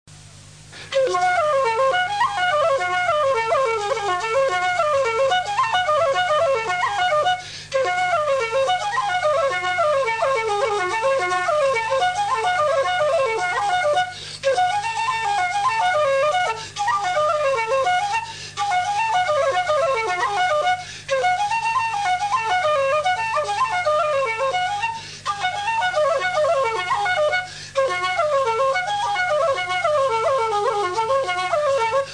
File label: flute